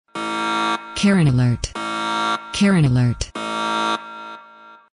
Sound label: alert